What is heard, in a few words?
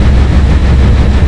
Fan; Counter-Strike Global Offensive; PS3 Windows Xbox 360